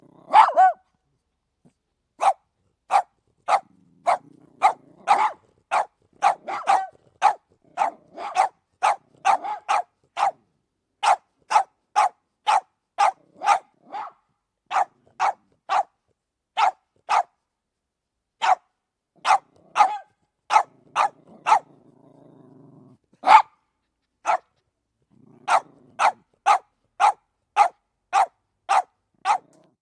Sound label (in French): aboiement de chien; sonnerie